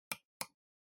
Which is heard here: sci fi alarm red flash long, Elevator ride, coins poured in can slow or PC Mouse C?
PC Mouse C